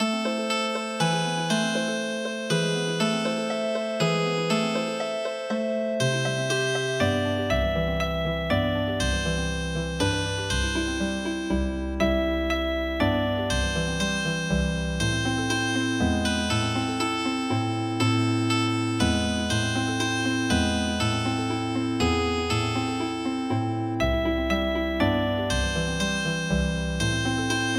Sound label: Healing Harp